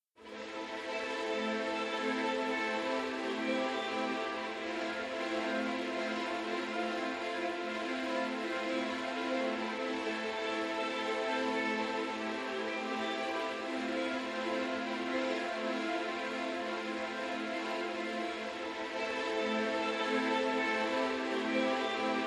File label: Strings Only